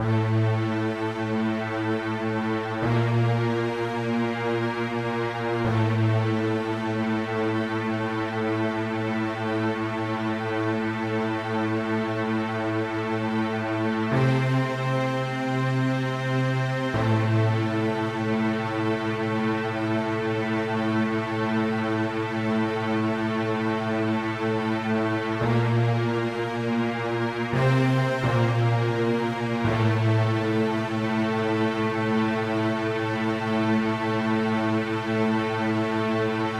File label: Loop Nofade Nobase; King Lucas